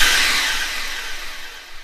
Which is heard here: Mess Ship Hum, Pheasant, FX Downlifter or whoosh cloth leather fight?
FX Downlifter